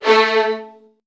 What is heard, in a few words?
A1, VN 16TH, Virtuoso Strings; ILIO; Kirk Hunter